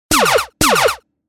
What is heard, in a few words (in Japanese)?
ゲーム系・セレクト音